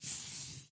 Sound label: Marker; Game rip; MiSide